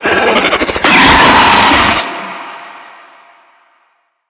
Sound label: Infested Ancient Healer Call; Enemies